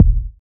original kick drum one shot d key, kickdrum tuned to the D note